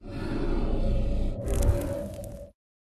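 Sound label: Dragon Skeleton stun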